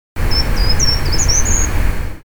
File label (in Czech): soupalek kratkoprsty certhia brachydactyla